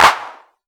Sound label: hand clap f key; Duration 0:01; Samplerate 44100 Hz; Bitdepth 24; Channels Mono; percussion; single hits one shots